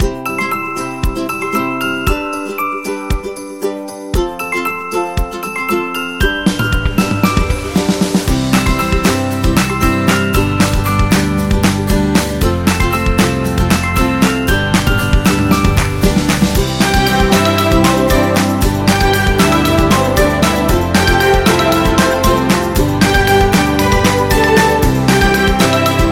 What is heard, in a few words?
Happy Kids Folk